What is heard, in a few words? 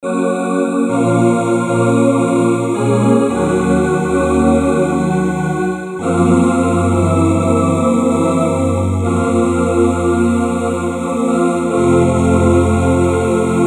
choir